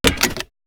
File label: seatbelt unbuckle